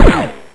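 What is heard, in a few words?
mp5k fire silenced, weapons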